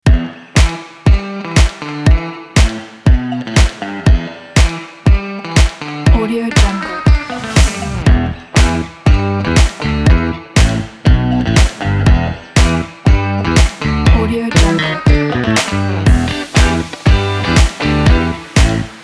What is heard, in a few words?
funk kit